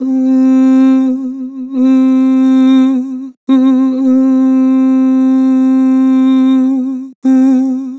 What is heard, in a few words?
Vibrato "Ooh", Harmor Vocals